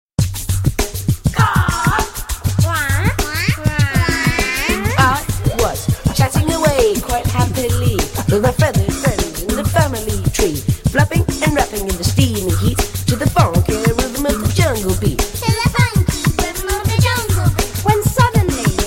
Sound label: Rap